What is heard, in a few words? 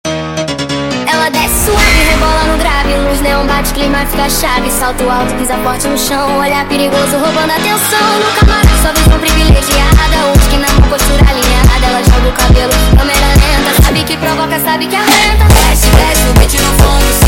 sped Up, funk